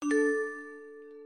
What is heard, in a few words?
notification message